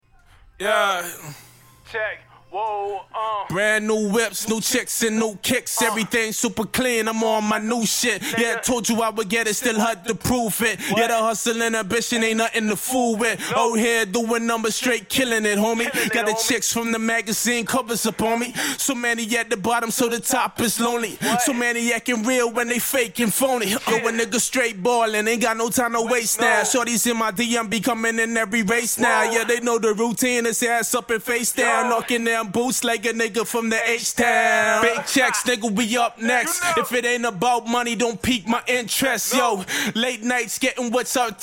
hip hop 186 Dm

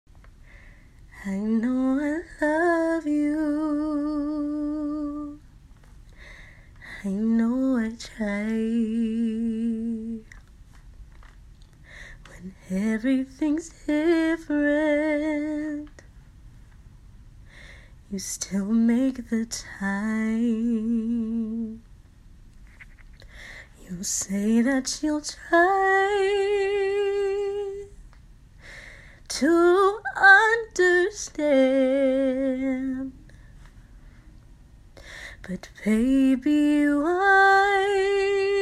rnb 100 G, Acapellas

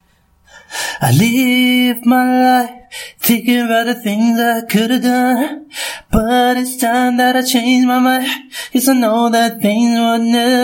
rnb 85 Bm

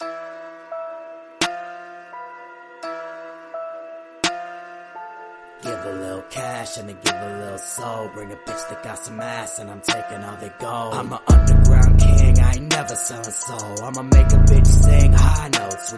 Remix, hip hop/rap

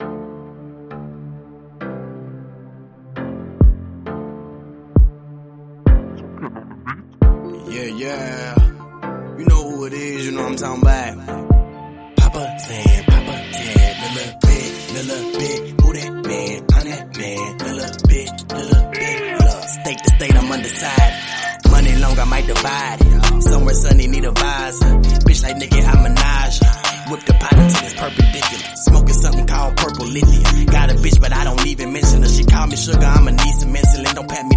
Remix

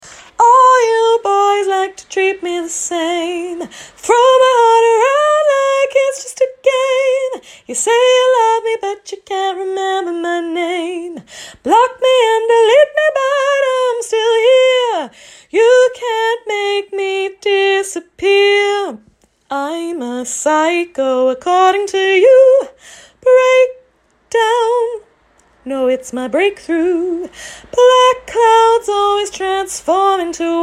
rnb 140 Cm, Acapellas

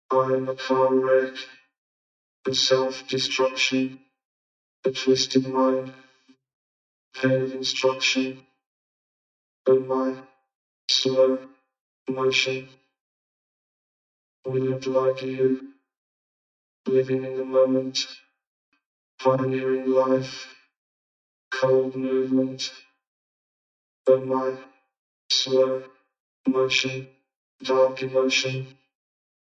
electronic 100 C, Acapellas

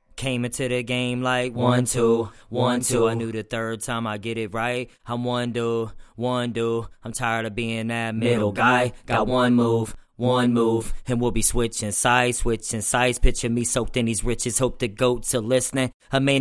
HH 88 BM

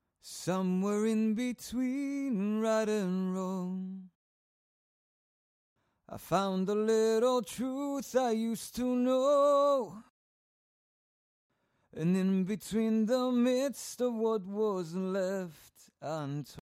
rock pop 82 AM

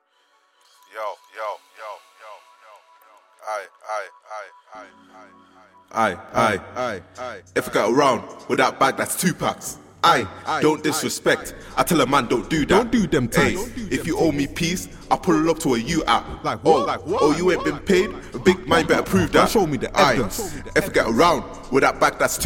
Uk Drill Vocals, uk drill, 145, Em; acapella

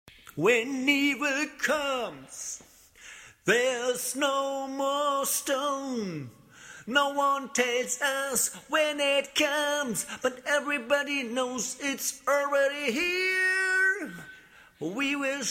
heavy metal, 120, C, Acapellas

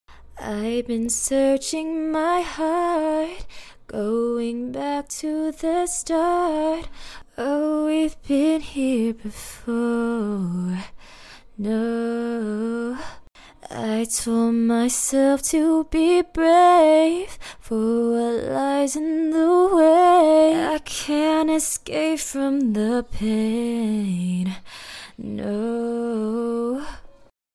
lo-fi, 129, Gm, acapella

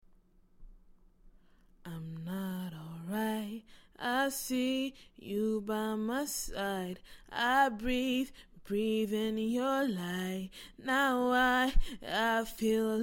soul, 70, A, Acapellas

